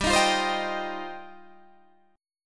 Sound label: G 7th Suspended 4th Sharp 5th G, Guitar Half Step Down